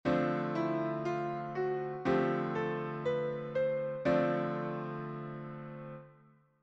bebop dorien, improvisation jazz; Gamme Majeure; Gamme Majeure et Modes Associés

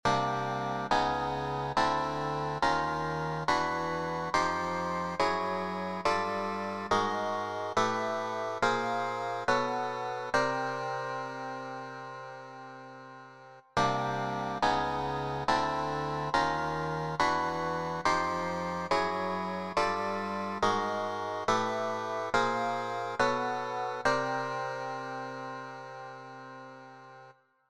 exercice triades, funk piano; Exercice Triades b795; Second Line 2 + 3

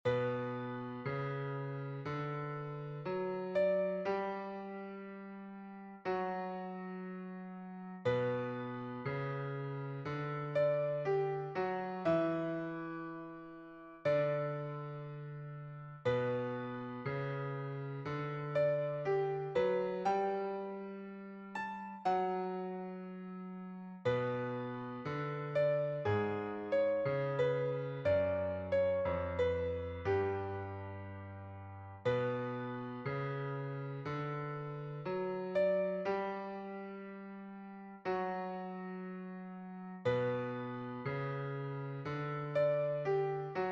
les cloches

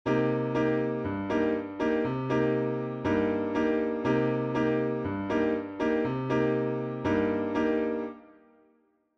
rythmique base basse simple, latin jazz bossa

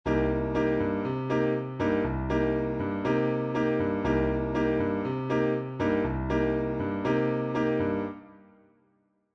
rythmique base basse bossa, latin jazz

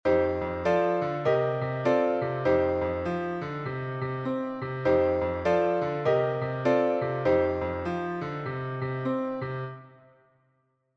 triades majeures, blues piano

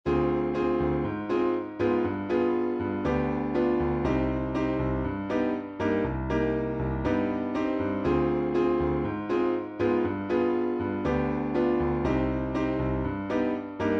251 mineur, latin jazz bossa

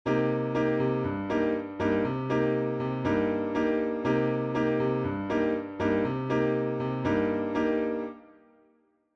rythmique base basse bossa, latin jazz

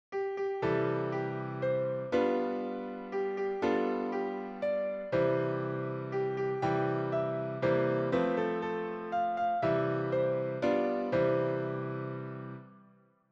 joyeux anniversaire, improvisation jazz; DO Majeur; La Tonalité Principale est celle de DO Majeur; Enchainements ii V I

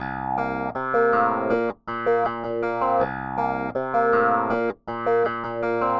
basse ghost, Ghost Notes, funk piano; Basse 1867 Ghost Notes; Second Line 2 + 3

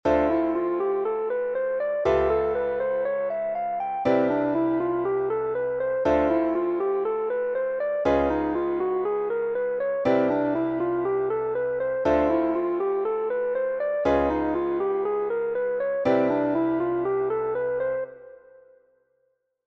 substitution tritonique modes, improvisation jazz; substitutions reharmonisations

